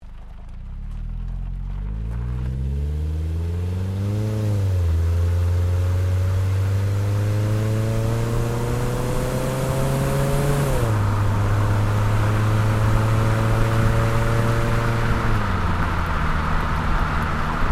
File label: CAR TOYOTA COROLLA DRIVE MEDIUM EXHAUST ROAD LOOP STEREO, hatchback; Car Toyota Corolla 20 09; Cars